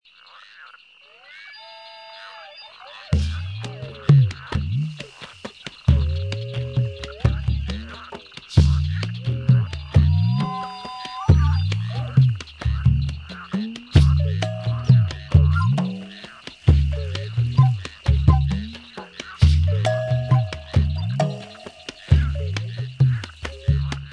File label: toucan, jungle drums